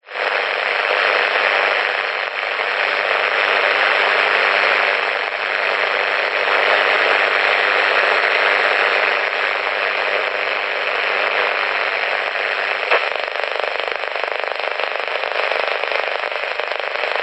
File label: technology radio weak signal clicks buzz static